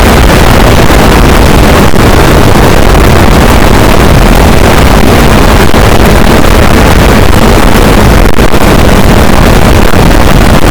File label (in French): cachalot negatif, boat, Physeter Macrocephalus